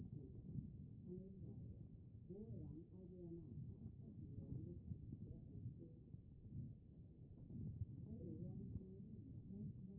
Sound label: HEART; B MODE